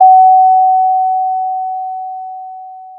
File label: Sound of a doorbell